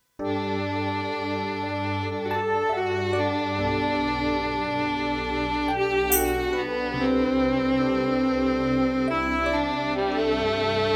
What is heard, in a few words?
Violin Ballad; Pa2X